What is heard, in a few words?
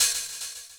Hhat, Hihat; Equipped Music; Loungin House